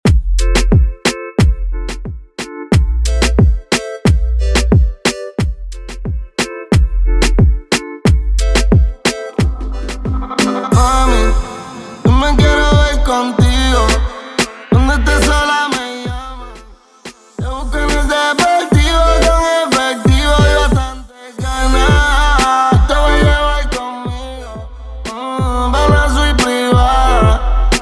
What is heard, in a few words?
Intro Outro 90BPM